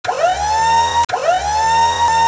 minigun starting

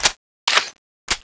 clip in; weapons; coilmg

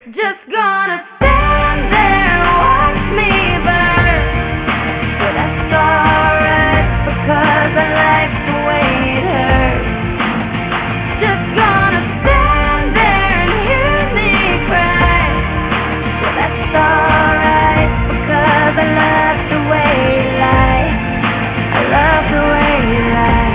disco

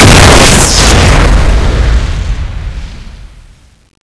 laser minigun exp

